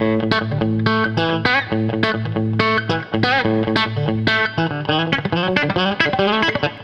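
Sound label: Country Guitar, Pre Chorus; Digidesign; Pro Tools Essential Loops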